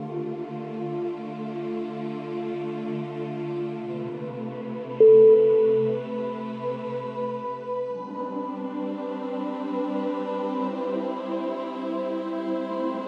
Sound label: ascending contemplation